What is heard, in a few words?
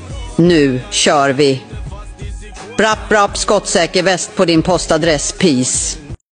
brap brap